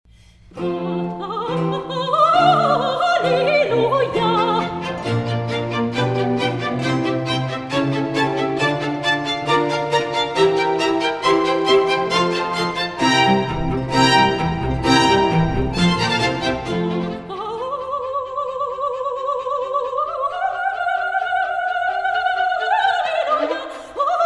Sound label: Alleluja solo